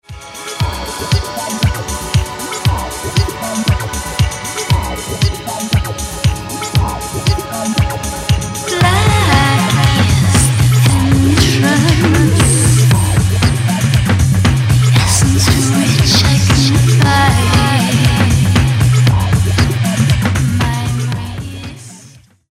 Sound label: distort